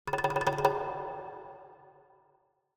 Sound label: Jungle Drums Fear Fast Thin Hits; Hollywood Edge; sound designer's toolkit v2